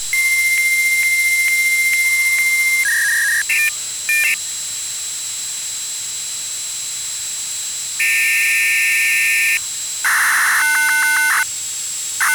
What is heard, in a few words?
nmt attempted data connection